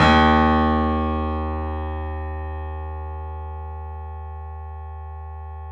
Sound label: D# R, piano; InVision Interactive; The Piano; BOES PLAY HARD